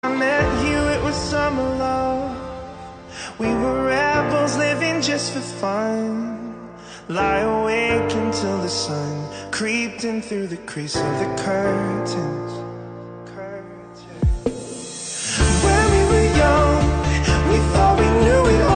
trance romantic ringtone

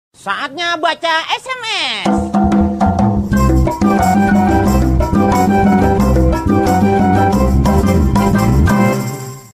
Japanese bongo ringtones

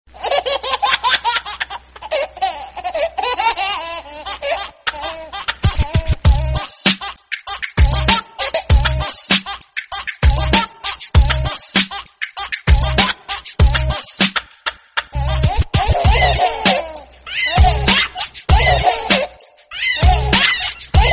funny baby laughing ringtone

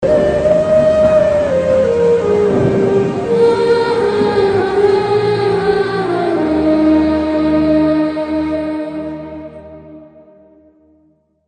pakistani national anthem instrumental ringtone

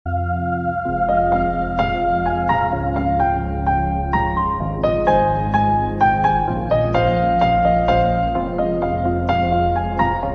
new apple remix ringtone

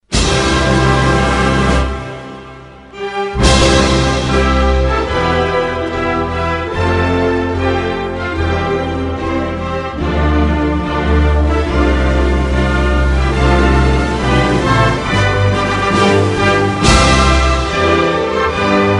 russian anthem ringtone

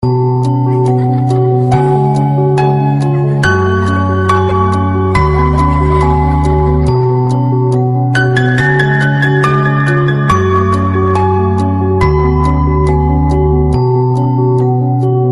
nokia tune ringtone

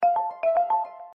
alert ringtone

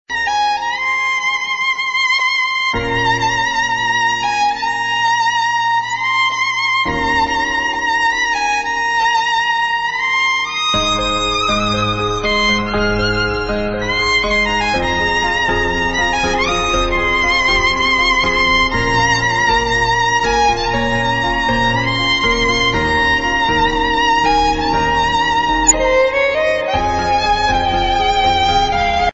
violin instrumental music ringtones

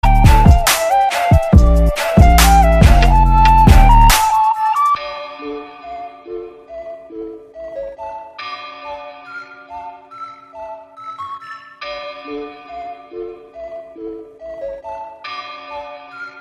electro pop ringtone

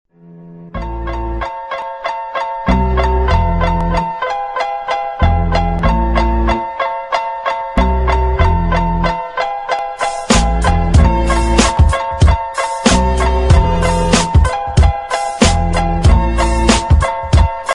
instrumental ringtone

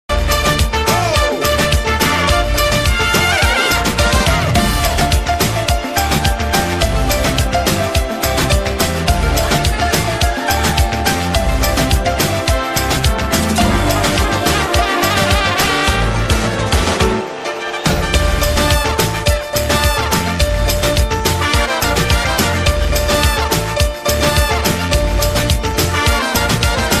ringtone

